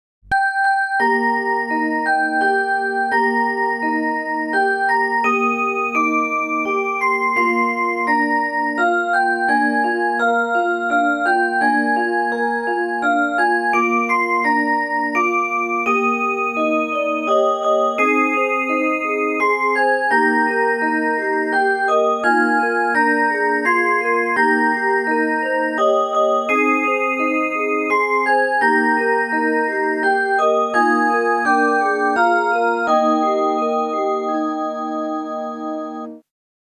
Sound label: Wiegenlied